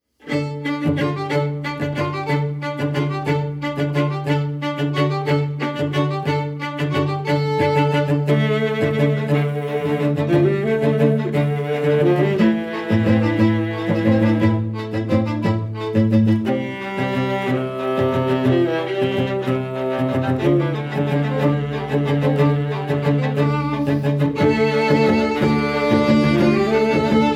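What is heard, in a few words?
quartet, String Ensemble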